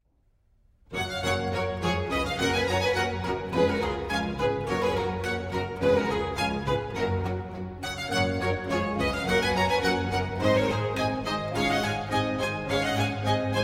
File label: Concerto For 2 Mandolins, Strings and Continuo in G Major, Allegro